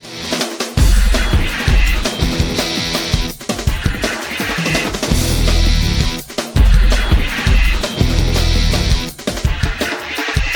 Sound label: Mono Music; 32kbs; Real